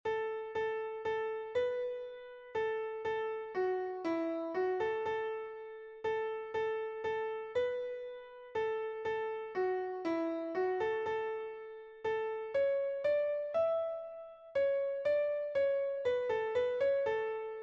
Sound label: Ave Maria Melody